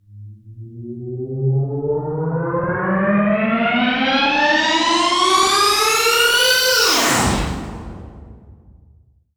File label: Uplifter